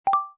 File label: notification